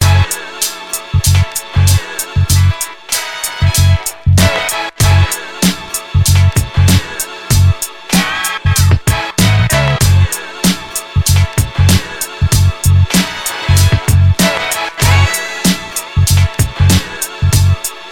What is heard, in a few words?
beat